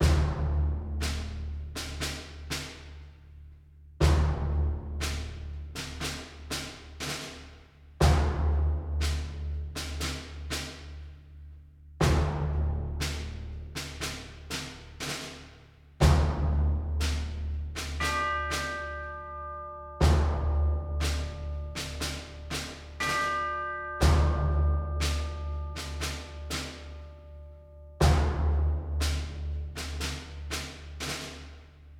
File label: Music Debrief Neutral Loop; Space Crew; PS4